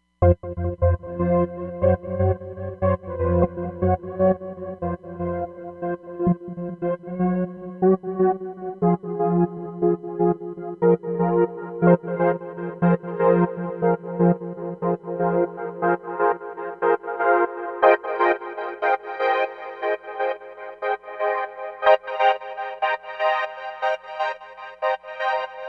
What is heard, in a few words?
Nu Trance, Roland Fantom XR